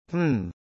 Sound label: hmm